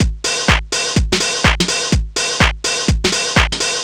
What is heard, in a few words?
drum loop roger full 125, Classic Chicago House; Sample Magic; Drum Loops